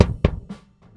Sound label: Boomboombap, Kicks; Airwindows